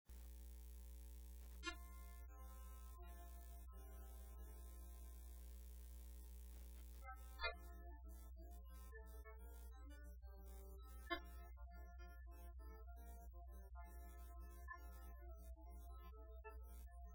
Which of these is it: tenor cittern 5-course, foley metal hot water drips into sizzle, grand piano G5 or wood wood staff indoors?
tenor cittern 5-course